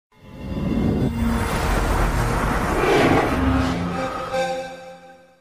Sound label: XBOX 360 Start Up